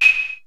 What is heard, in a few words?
808 CLV, 480L; Wizoo; Platinum 24 Electronic; 480L GA 808